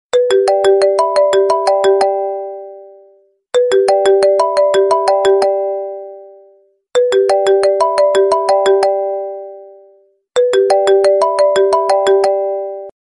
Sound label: apple ring